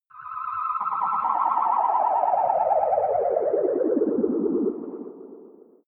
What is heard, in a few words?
far ufo landing